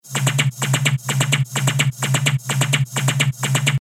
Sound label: 128 BPM